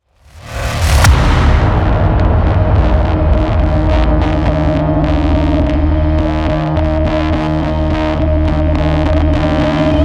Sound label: PVP Lose; Doom Eternal; PS4; Stadia; Xbox Series X/S